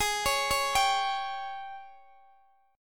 G sharp Major 7th Suspended 4th G sharp, Guitar Open D